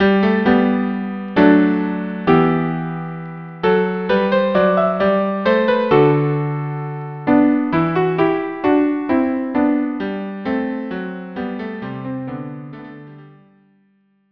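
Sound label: 2 violas; march from Scipio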